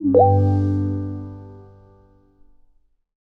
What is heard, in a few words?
charging started low; power; chromeos